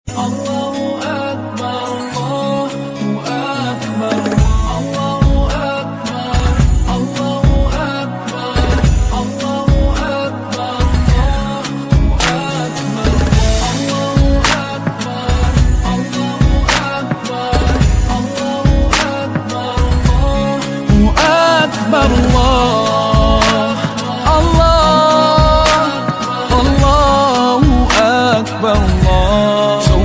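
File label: english islamic ringtone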